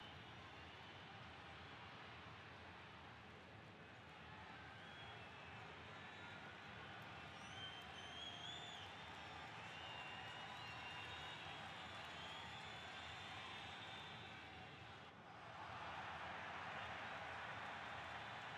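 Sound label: ambience arena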